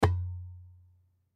Goombay Drum Hit, Goombay Drum Hit Single; Goombay Drum Hit Single Muted; Drum Sound Effects; Blastwave

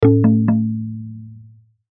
Logo Tabla Rhythm Drum; Blastwave

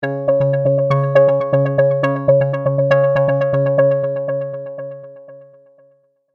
Multimedia Tone-Thinking/Processing

